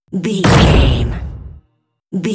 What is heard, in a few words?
cinematic drum hit trailer